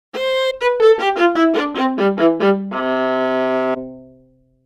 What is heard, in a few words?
viola; automated real time articulation selection